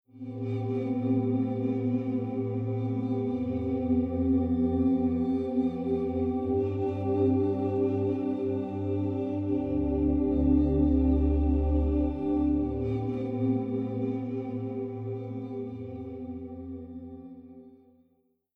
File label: Basic Pad EQ Off